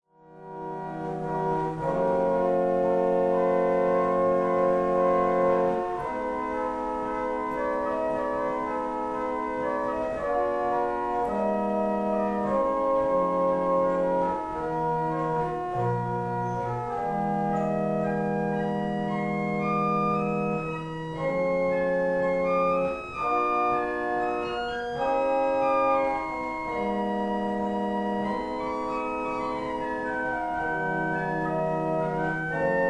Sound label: dulciana